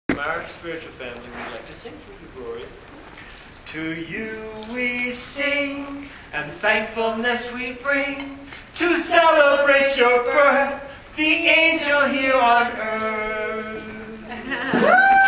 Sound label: Birthday song